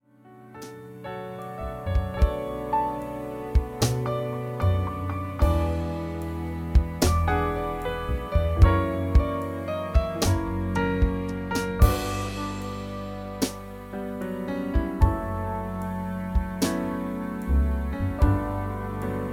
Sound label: Full instrumentation